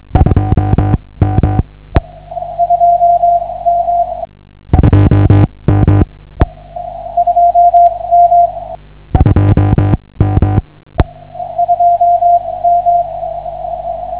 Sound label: cw